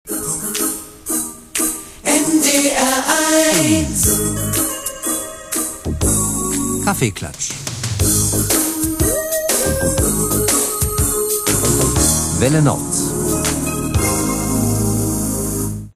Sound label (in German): welle nord kaffeeklatsch, jingles